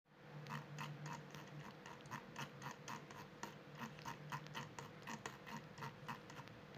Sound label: Silence Scroll